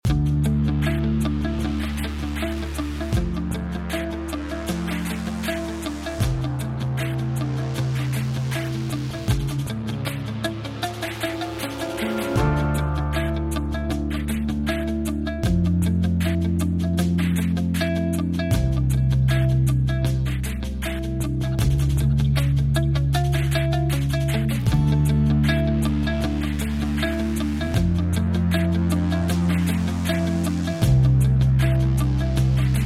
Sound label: Instrumental